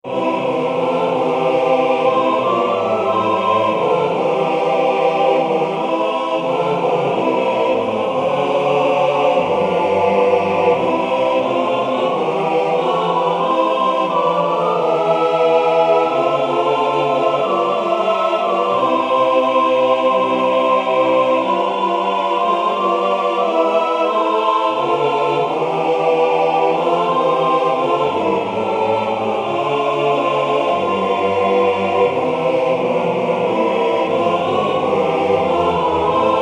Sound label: jesus is risen today vocal; Original Compositions for Church Choirs